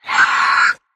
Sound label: raptor short roar; soundscrate